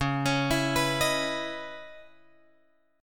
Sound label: C sharp Minor 9th, chord